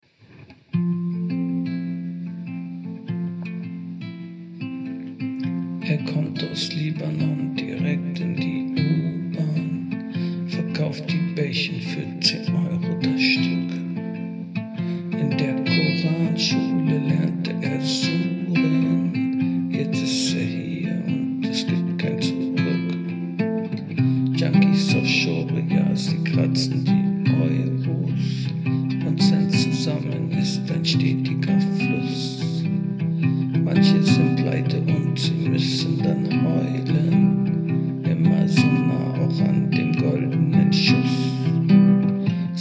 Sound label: Tremolo